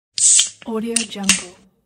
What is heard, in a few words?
beer can open